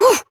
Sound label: Pain, Adult Operator; New War